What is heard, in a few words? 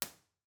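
site 2 1 way, impulse response, Trollers Gill; b-format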